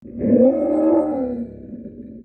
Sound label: roar viking